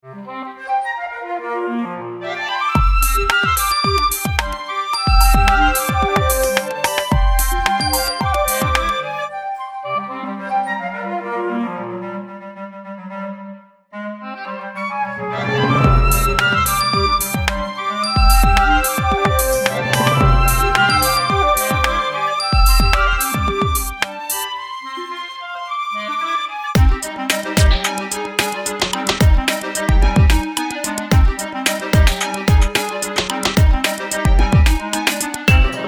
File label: orchestral experiment